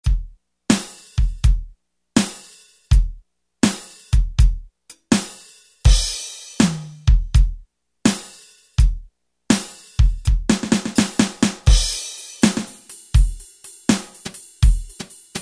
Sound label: Country Kit, Alesis QS8; Factory Preset examples